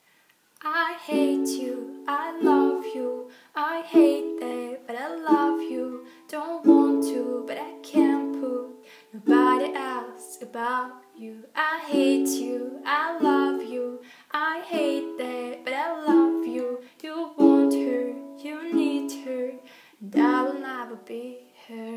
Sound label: ukulele cover